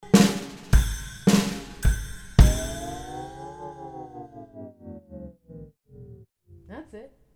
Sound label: bus lullaby